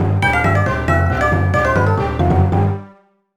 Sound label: Common Game Start; Tamagotchi no Pichi Pichi Omisecchi DS